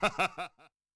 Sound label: Laugh; Yamaha DTXpress-II